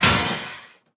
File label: Chandelier Break